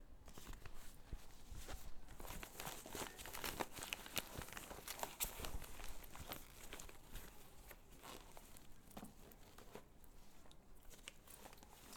Handbag Being Opened And Something Being Taken Out